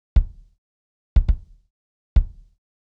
Kick; hydrogen

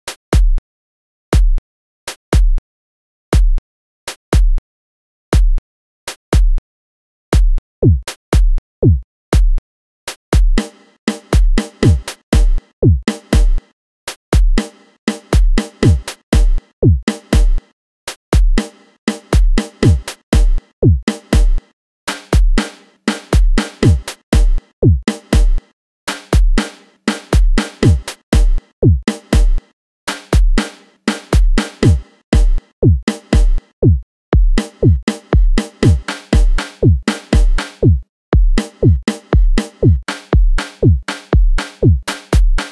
drum; hydrogen

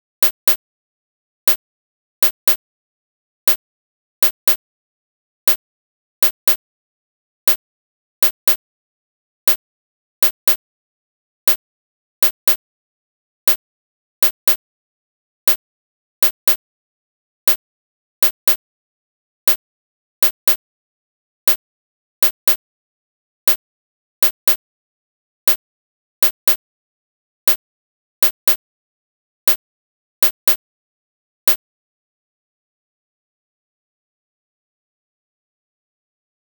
hihat closed; hydrogen

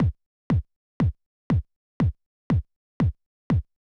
Plastic BD; hydrogen

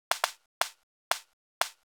Clap; hydrogen

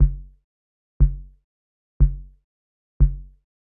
Kick; VariBreaks; hydrogen

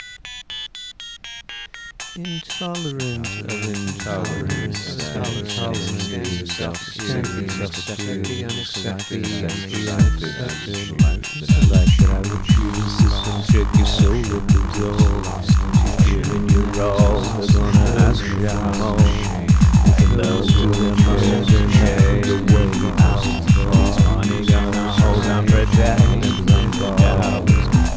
hardcore test

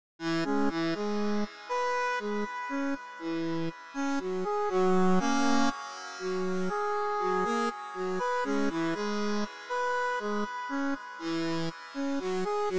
Synth chord

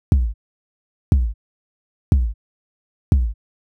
Kick Power; hydrogen

